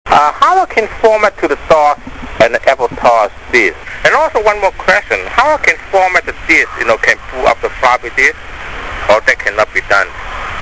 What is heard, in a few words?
Boot Disk